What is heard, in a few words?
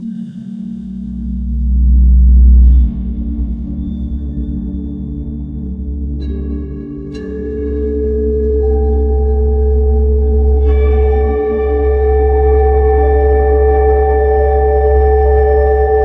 ambient meditation